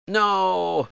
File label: noooo; Half-Life